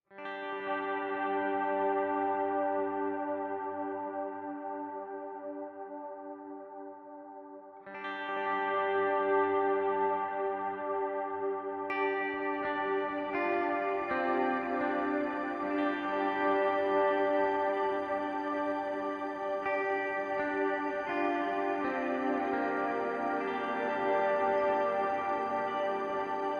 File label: Ambient Guitars